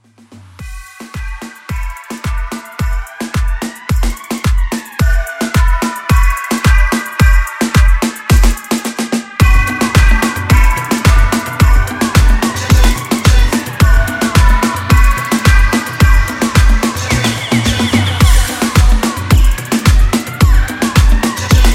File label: 80s Moombah Re Drum 109